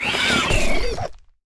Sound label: minigame SFX enemy spawn; bloom minigame